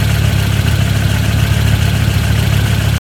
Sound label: Vaz 08 Idle